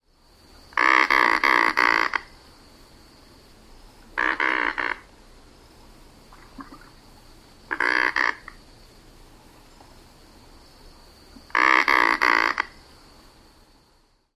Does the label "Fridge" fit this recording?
No